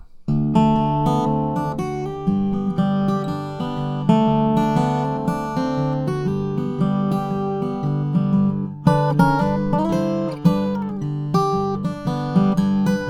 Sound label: Countryman